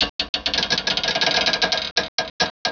metal bridge creak; valve; anti reality

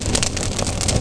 fire

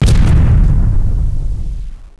Med, explosions; ambient; valve

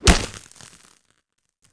hit

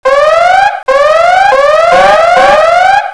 satellite activate; valve

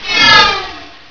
laser sword; valve; creep hole

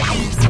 forcefield unloading; valve

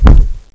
pl wood, player; valve

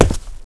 concrete; valve

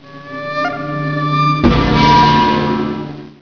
death music; valve

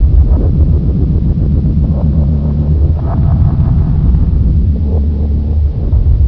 alien powernode; valve; dutch

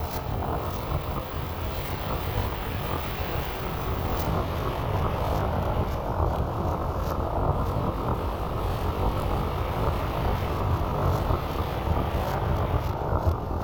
storm

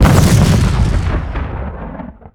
mortar explode; weapons

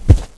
carpet; valve

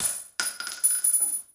shell; valve

